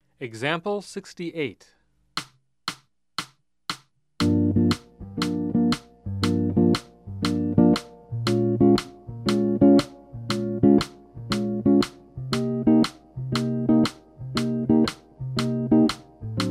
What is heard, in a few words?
Jazz; jue shi ji ta